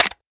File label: aug clip tap, weapons